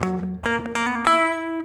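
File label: guitar, Drum & Bass, 145 BPM; Ueberschall; Drum & Bass Resonance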